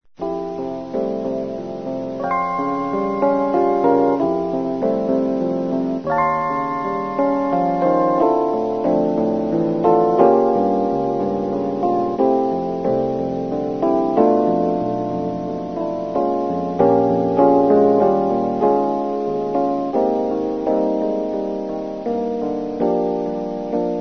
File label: piano